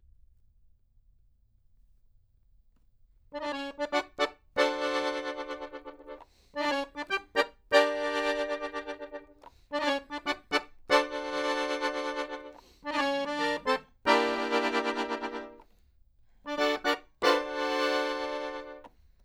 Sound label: Accordion